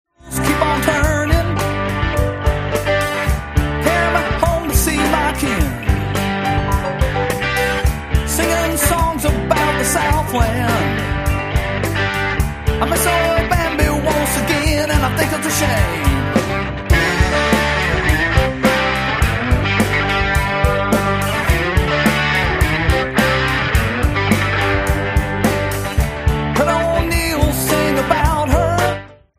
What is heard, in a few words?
play along drums classic rock